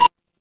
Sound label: office card reader